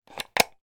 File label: battery put into charger